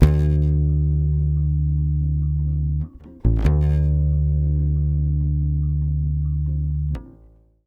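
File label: 140 BASS D minor 7, Brazil Bossa; USB Soundscan; AKAI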